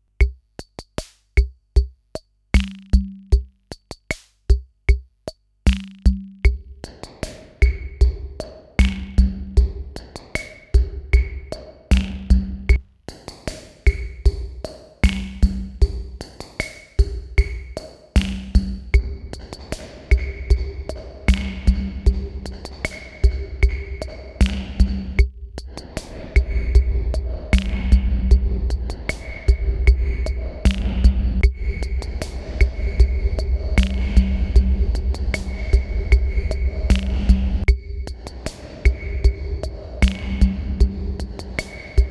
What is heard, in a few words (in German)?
Korg Minipops Alle Presets